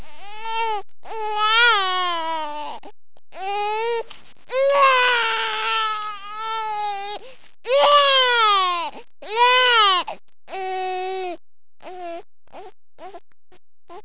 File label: crying